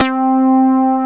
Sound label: MOOG PRO, BASSES; Masterbits - Vintage Voltage; VV BASSES